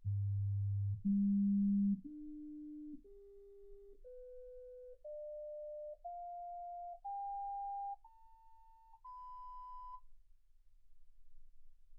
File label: sinus tones 48kHz